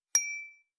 Crystal

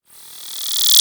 Laser effector

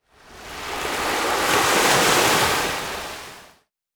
Ocean wave

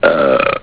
burp; Simpsons

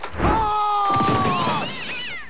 scream; Family Guy

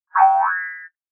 Boing spring

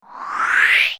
Breathy up

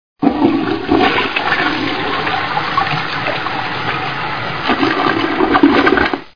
toilet flushing

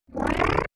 Slime gun

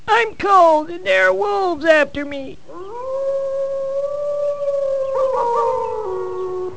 wolves; Simpsons

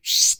Air can

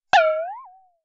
Boing rebound

